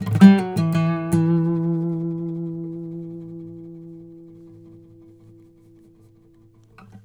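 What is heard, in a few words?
baritone lick E, Blues Guitar; ILIO; Fretworks; 108 BARI LICK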